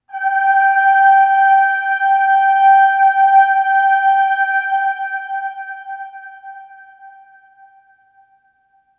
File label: Aah Long G4 Long Reverb; Harmonic Building Blocks